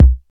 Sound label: rich lows bass drum single hit c key